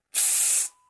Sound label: spray